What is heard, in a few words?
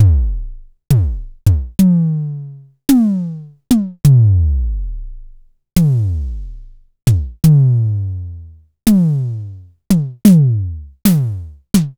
Tama TS-500